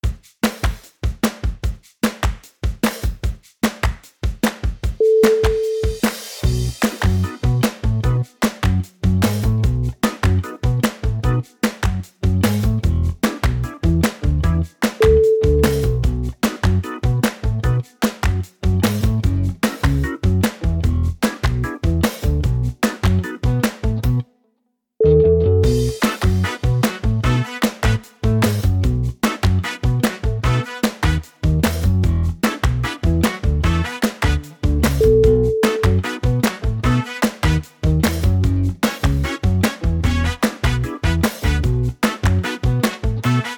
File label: vintage dance shoes